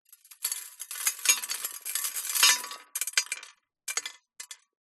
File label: foley debris small fall onto metal